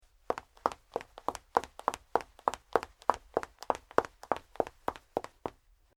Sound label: footsteps woman; human